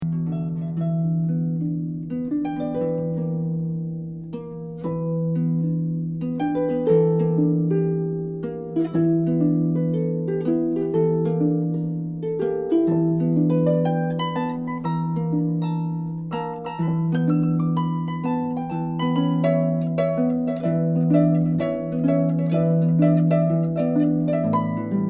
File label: Fuschia Blues